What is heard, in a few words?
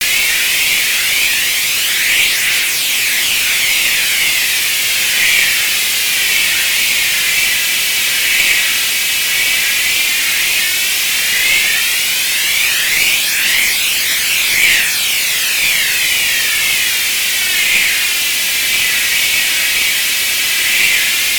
Wind with Pink Noise